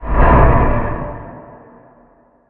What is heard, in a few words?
move